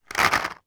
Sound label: toy guess who flaps down all together